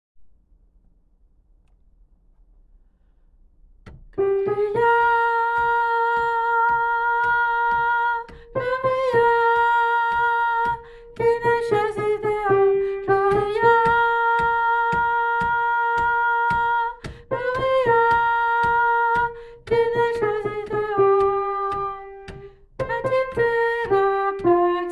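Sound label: Gloria soprano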